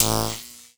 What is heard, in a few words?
teleport; Electricity Game Sound Pack